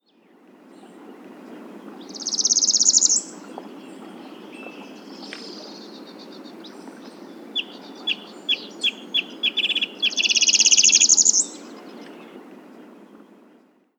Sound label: Northern Parula Setophaga americana